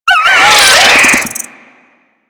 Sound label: Infested Biped Death; Warframe; Moa